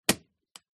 Ski Binding

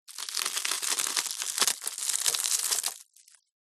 food crispbread squash crush on wood

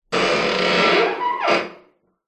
horror door heavy metal dungeon creak squeak

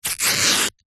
foley clothes running shorts polyester rip

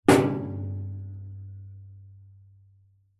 metal tool tray empty knock bash resonate; foley; zapsplat

